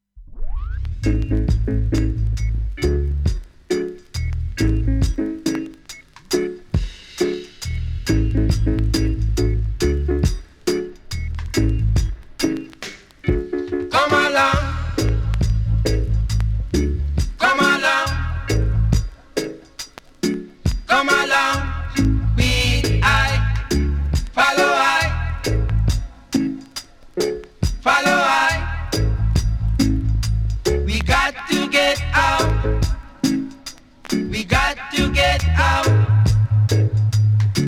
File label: Blue Bells